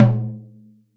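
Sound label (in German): Trommel Mitte F, sticks; SoundBytes - Early Patches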